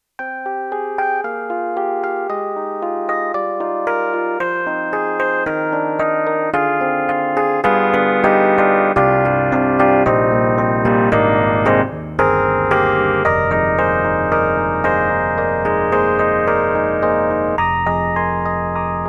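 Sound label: YAMAHA MU1000EX Diploid, piano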